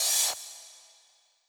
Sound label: cymbal reverse fast